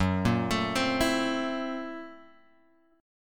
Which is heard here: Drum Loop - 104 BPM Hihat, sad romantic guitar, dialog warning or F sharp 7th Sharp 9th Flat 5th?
F sharp 7th Sharp 9th Flat 5th